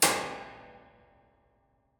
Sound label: plate stereo